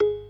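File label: PERC C sharp 3; Best Service; Advanced Media Trax Modern Composer; B3 FULL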